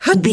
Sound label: voice female effort jump action